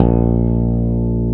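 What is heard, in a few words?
fretless bass A1; AKAI S-Series CD-ROM Sound Library VOL-2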